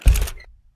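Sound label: ui button next car; lightning-speed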